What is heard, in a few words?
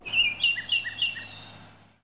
oriole, ambients